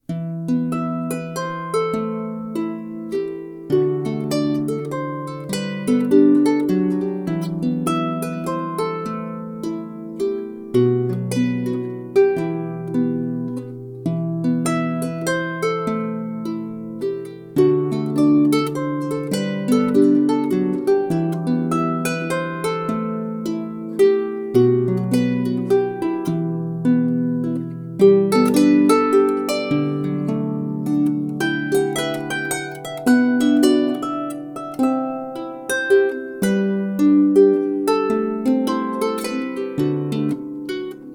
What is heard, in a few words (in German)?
Mazurka